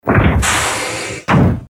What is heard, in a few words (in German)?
schott close